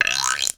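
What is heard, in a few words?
small bamboo guiro; Voice Crystal - Steve Reid Definitive Percussion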